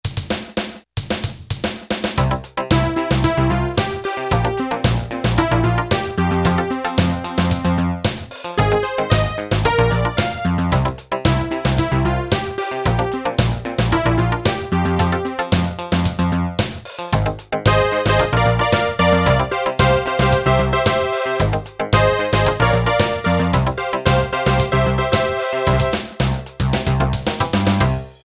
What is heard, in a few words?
DANCE, Yamaha PSR-300; Styles